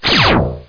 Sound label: Bonus Sound